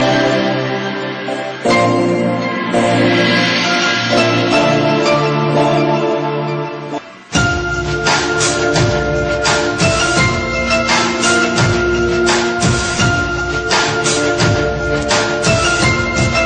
Romantic Tone

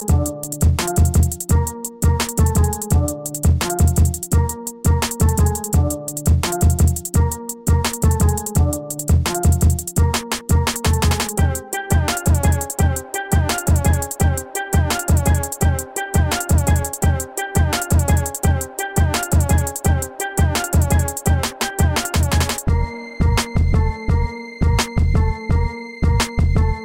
Beat; meloboom

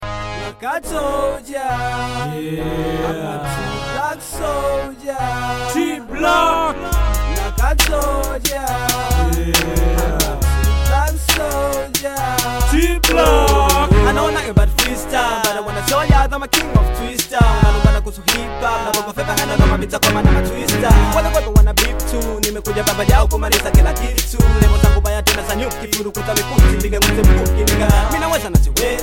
Oooh Oooh

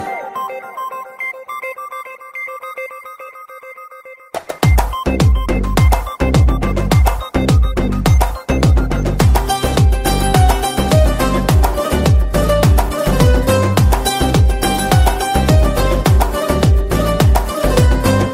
Cool Music

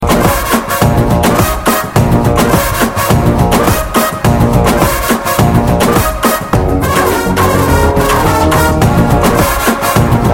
Kick

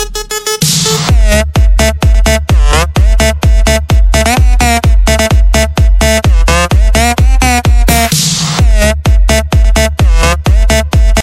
Bomb Bass

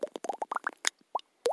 Dribble Lip Roll

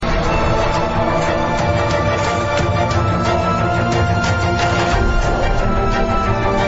Dramatic Requiem

